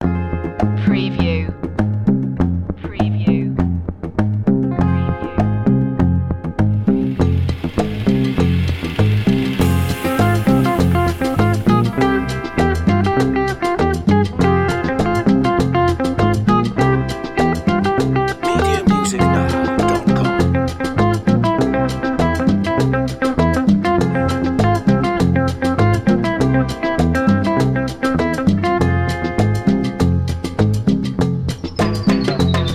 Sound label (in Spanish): cumbia libre